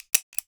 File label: 9mm Dry Fire Press Release Trigger Safety Off mono OUT OF BULLETS; SFX HANDLING; FS92; Massive Cleavage vs. Zombies Awesome Edition